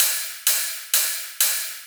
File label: ride loop